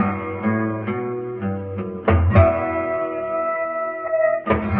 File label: guitar to flute, loops; Phonky